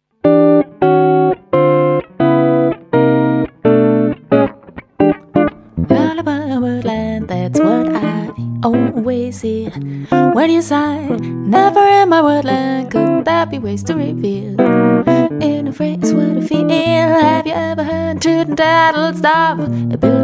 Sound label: Lullaby